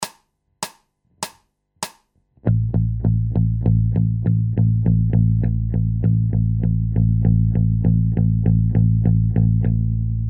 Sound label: Bass Loops